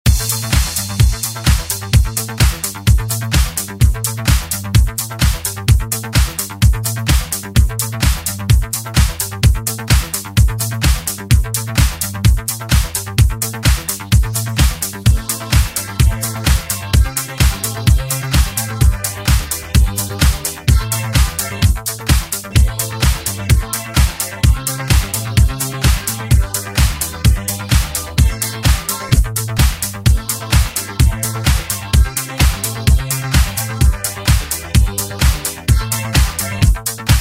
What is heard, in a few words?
80s ReDrum